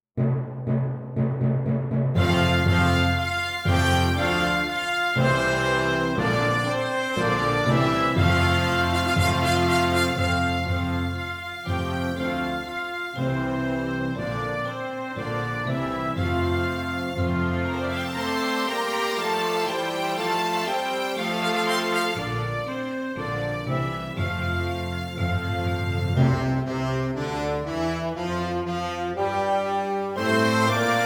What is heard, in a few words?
Full Band; Good King